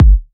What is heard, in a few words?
Bassdrums; Vengeance Samples